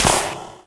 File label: cave grounds fall; Golden Crayon Sounds